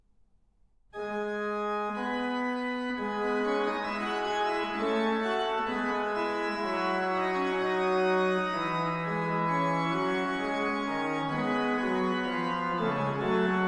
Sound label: Fuga sopra il Magnificat BWV 733; Bach